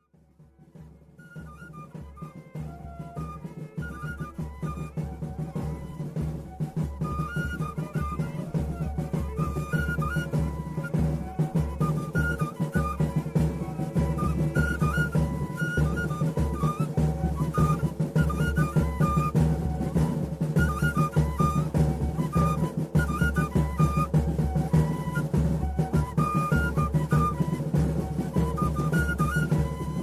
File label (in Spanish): Toque Diana